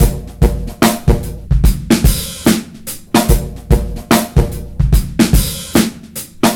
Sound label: 73 bpm, Breaks; 90 s Sample CDs; Planet of the Breaks